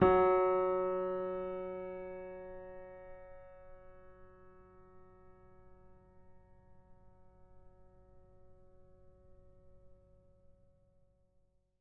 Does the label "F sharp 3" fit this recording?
Yes